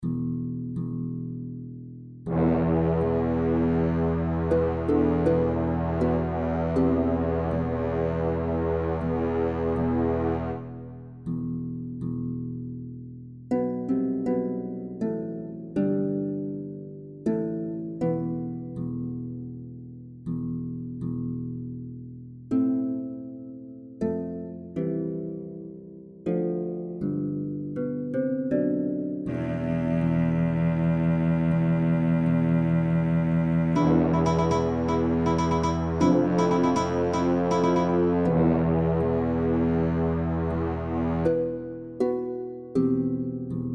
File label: Sewers & Caves